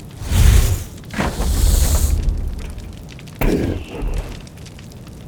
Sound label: Fire Enemy Attribute Loop; AudioWizards